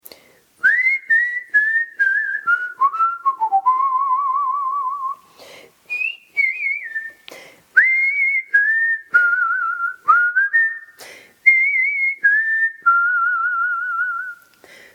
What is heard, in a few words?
gentle acoustic; SesameSt video intro